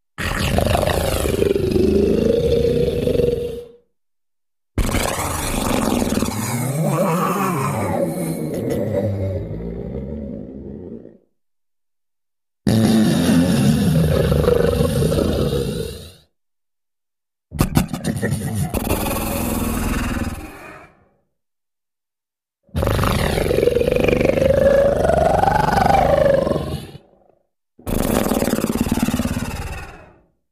Big Rev Monster Snors

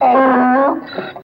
Donkey Huuuuuh Groan

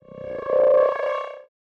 Interface Screen, Sci-Fi Space; Interface Screen 4

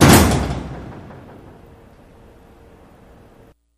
Jail Cell Door Slam S; jail & prison doors

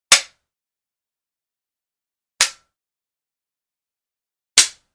Samurai Sword Hits, swords

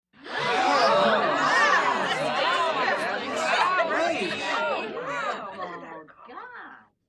Gasp Astonish Sml Cro

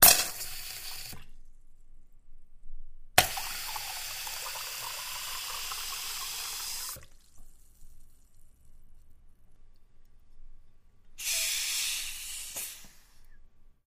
Water Hiss 3 Blasts, seltzer bottle

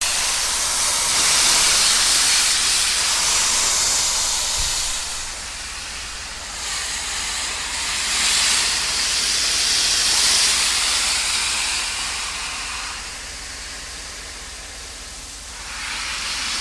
Steam Train Blasts

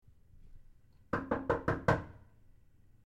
Several Light Knocks, impact

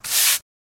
Sweep Broom Sngl; brooms & mops

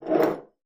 glass on counter

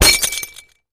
Bullet Impacts Glass

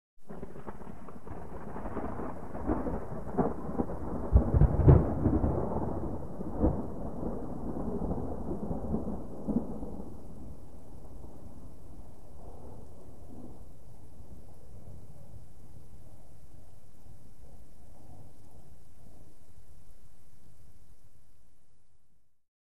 Thunder Small, distant

